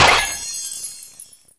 crate spawn; Worms3D

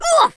"oof"; speech

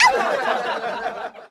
oof

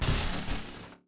brick

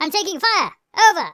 ouch; Biggles

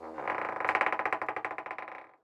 Met Crk

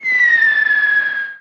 Bomb Whistle; Worms3D

